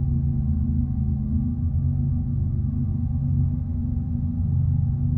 ambient generic station idle; Stellaris

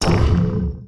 disruptor hit, weapons; Stellaris

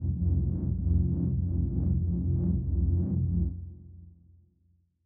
kaiju superweapon energy pulse; biogenesis

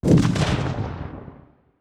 kaiju lightning hit lightning crack; Stellaris; biogenesis; weapons

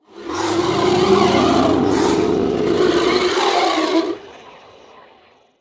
alien nature; Stellaris

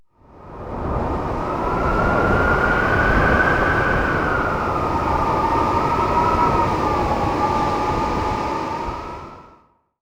archeology event wind ruins; ancient relics

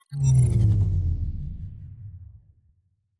cloaking deactivation button; Stellaris; first contact

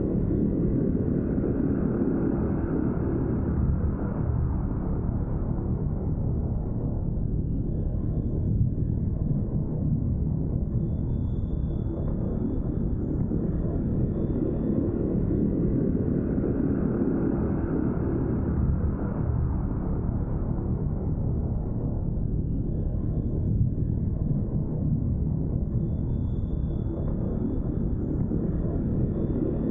ship starbase idle; Stellaris; machineage

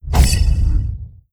dimensional horror tentacle attack; Stellaris; guardians

